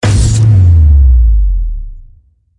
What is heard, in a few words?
Shutdown Hit Downer